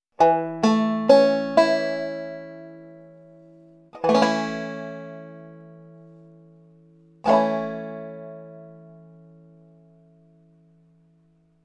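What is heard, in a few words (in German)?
a dur akkord barree griff, banjo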